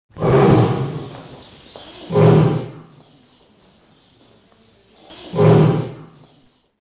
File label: tiger; jurassic